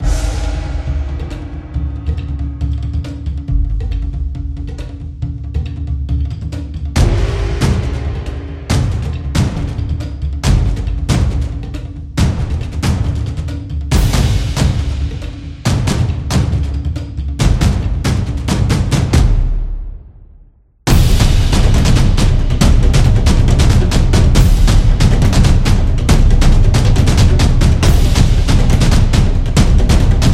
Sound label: Japanese, tribal dance